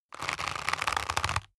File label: leather creak short medium